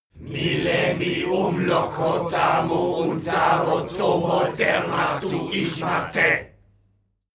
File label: male crowd evoke